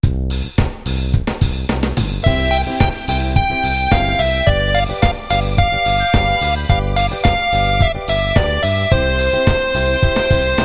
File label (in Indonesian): Instrumen Jagalah Kesehatan